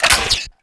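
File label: crossbow; AoM-ARTofMyth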